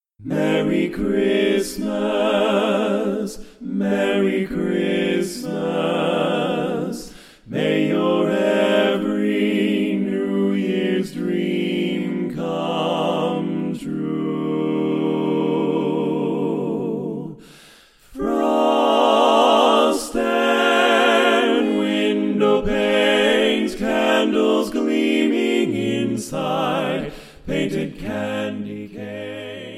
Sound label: vocal harmonies